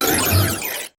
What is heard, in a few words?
player teleport to a new, abstract